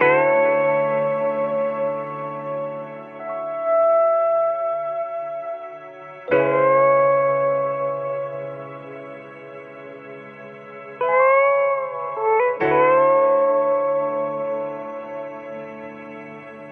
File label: guitar, Sad